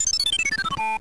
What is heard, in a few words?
Level Enter; SNES; SMALLSTARS